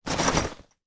rucksack pick up or movement; foley

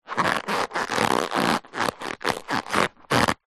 shoe rubber croc creak stress; foley

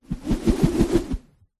foley rope spin swing fast whoosh

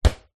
sport soccer ball bounce in small internal space

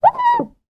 household window glass rub squeak single; sound effects one

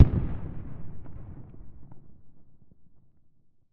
explosion distant; sound effects one

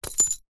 household padlock small set down on carpet; zapsplat

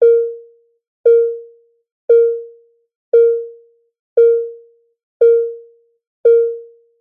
hospital machine monitor beeps dull

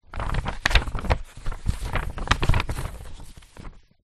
paper several sheets movements; foley

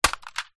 impacts plastic vhs cassette tape

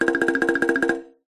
cartoon run scramble bongos

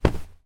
household duvet hit hand; zapsplat

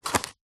household aftershave card box open; zapsplat

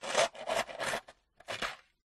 shovel clearing snow from path; toolmisc; field reports

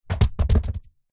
foam rolled up camping mat drop

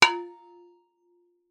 impact metal blunt wooden strike resonates

